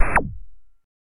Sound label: Video Game Fire Effe